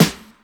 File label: strong bottom end snare e key